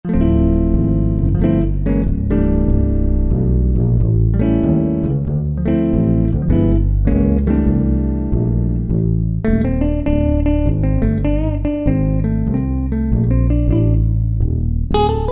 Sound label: Jazz Guitar Duo, Roland D-50; Factory preset demos